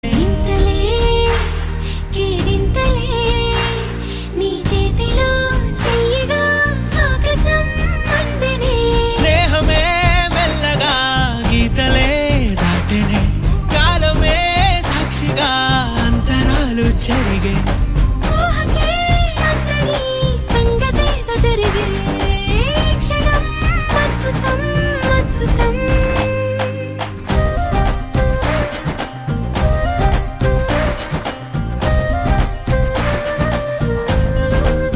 Telugu Song Ringtone

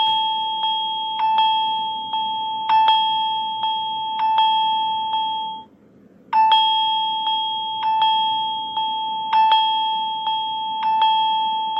Crystal Echo Samsung

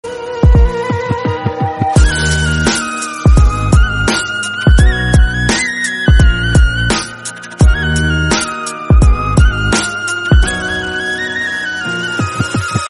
Bgm, ringtone

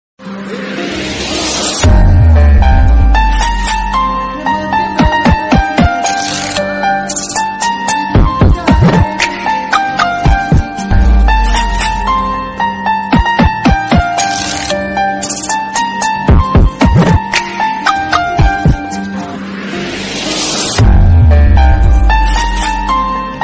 Instrumental, ringtone

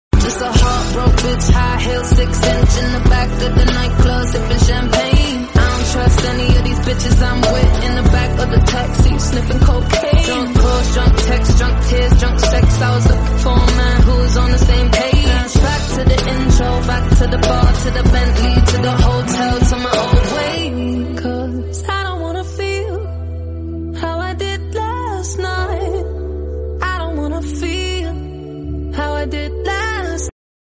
Escapism Instrumental Bgm

